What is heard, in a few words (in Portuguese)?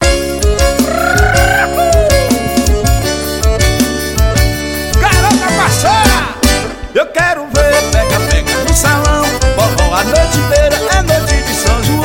forro